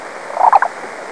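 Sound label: black-billed cuckoo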